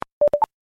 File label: Dial